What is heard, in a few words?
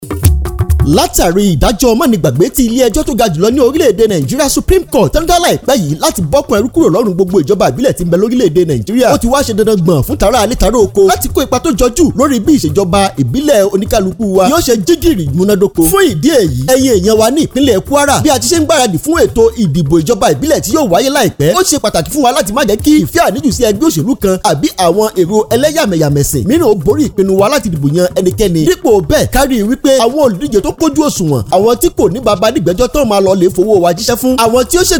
Election Jingle YORUBA